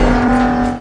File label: machine whirr; Weird and Interesting Hits